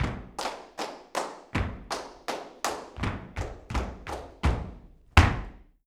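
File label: percussion, FARRUCA TAP, Flamenco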